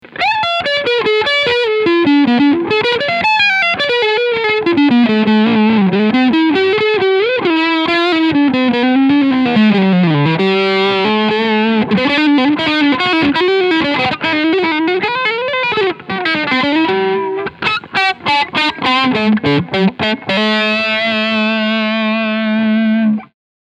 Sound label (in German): Harley Benton HB-35 Plus Overdrive Steg Pickup